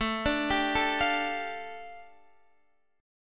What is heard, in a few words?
A 7th Suspended 4th Sharp 5th, A, down, Guitar, DADGAD